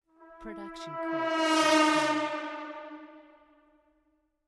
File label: high brass flutter; soundscrate